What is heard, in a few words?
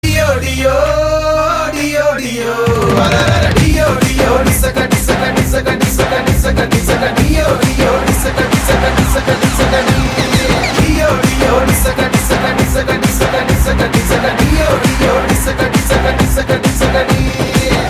Humming Ringtone